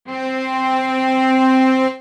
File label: c violin